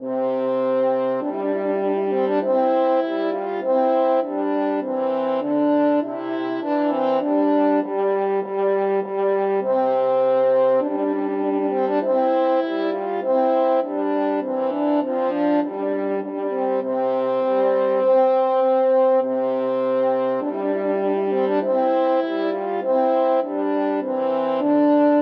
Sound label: french horn duet